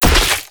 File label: Teleporter Blood Splat; Evolve; Wraith